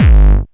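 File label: KORG Electribe SX bass drum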